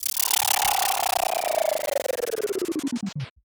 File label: Transition Spin Down Descend; Production Element